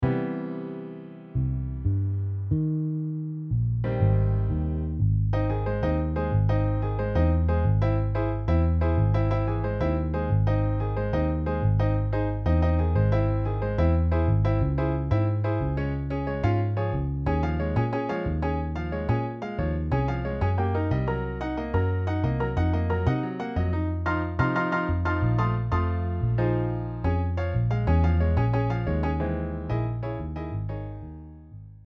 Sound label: Piano Bass MIDI